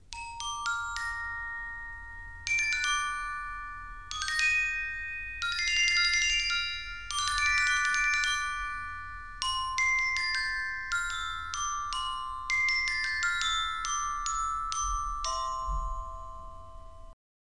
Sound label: glockenspiel